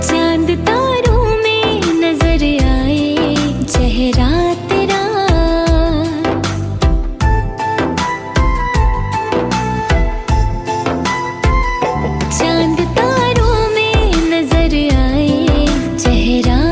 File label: beautiful love old song ringtone